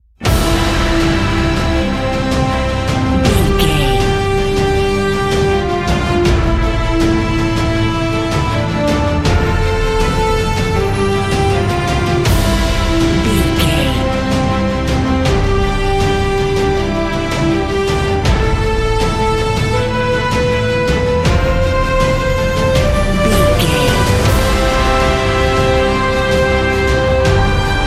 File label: cinematic corporate